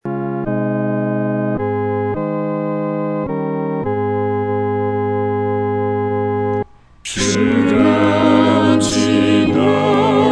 合唱 四声部; 岂能让主独背十架

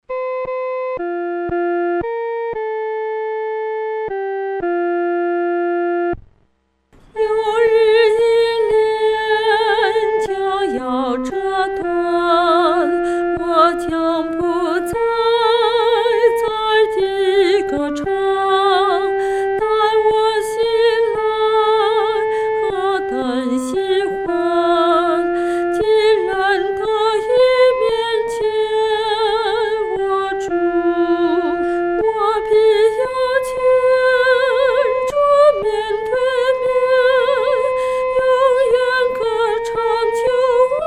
独唱 第一声

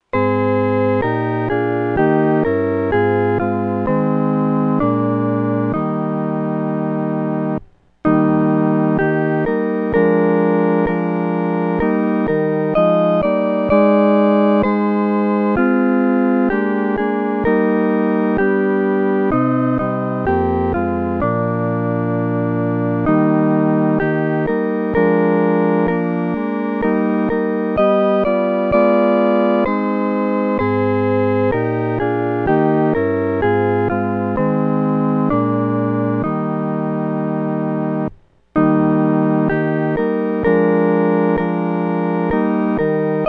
合奏 四声部

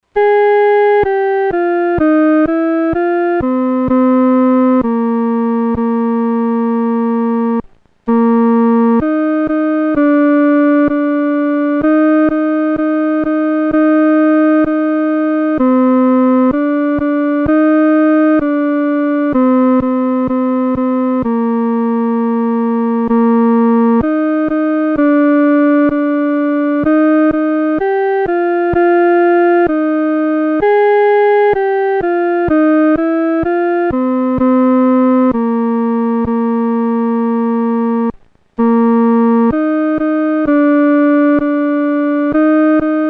独奏（第二声）

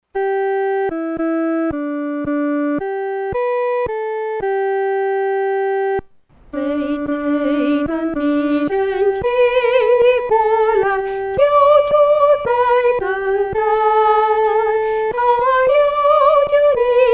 独唱（第一声）; 只要信祂

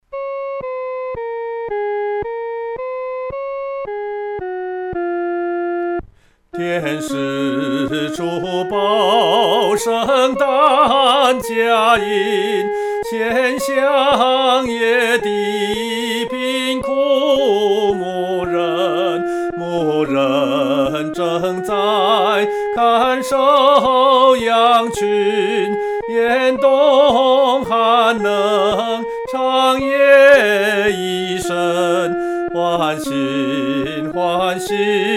独唱 第一声; 天使初报圣诞佳音

